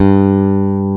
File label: EBASS G2